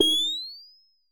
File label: Tonic Electronic Whistle